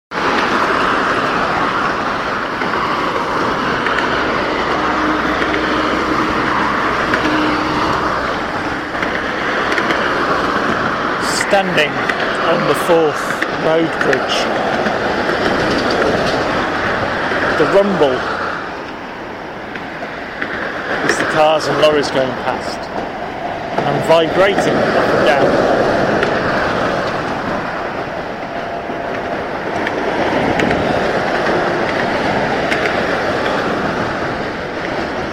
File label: forth road bridge noise